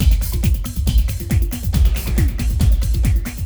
Clubbin' 138

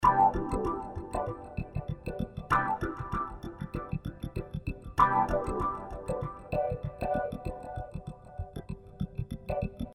Swinglefunk

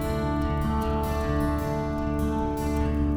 Picking G

